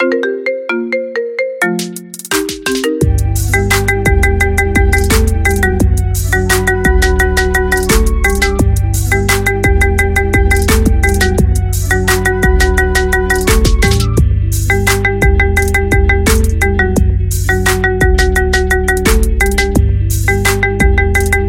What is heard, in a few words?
marimba ringtone; i phone 7